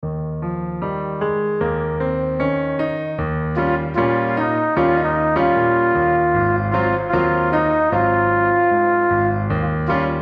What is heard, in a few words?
Showtime with trumpet